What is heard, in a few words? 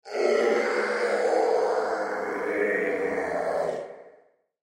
Sci Fi Cyborg Bellow Creature; Alien; Blastwave